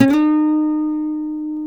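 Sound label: E 4A MARTIN SLIDE, Guitars; MARTIN 6; Spectrasonics; Hans Zimmer Guitars VOL-1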